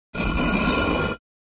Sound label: Cinder Block Scraped